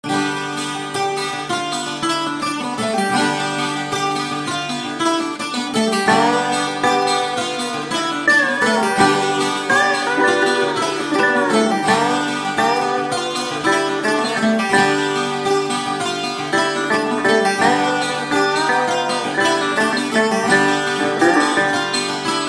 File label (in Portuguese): viola dobro; tibau